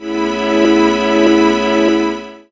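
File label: string loop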